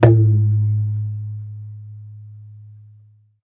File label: lower, toms; Real